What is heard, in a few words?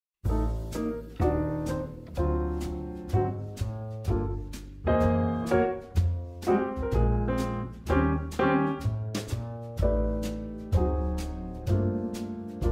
for Tenor Sax